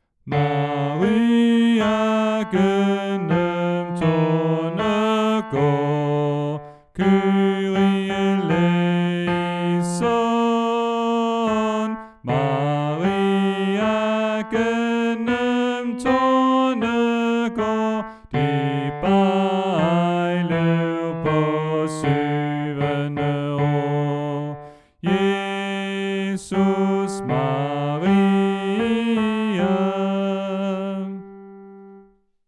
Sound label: Bas; oevefiler